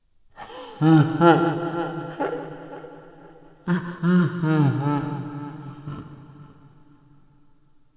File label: Laugh Giggle Girl; Five Nights at Freddy's; FNAF